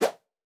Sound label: Throw Item; GameSFX